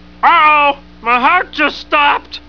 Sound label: heart; my heart stopped